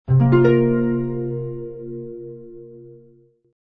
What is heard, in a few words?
jingle